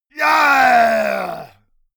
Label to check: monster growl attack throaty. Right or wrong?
Right